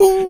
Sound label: "ooh", voice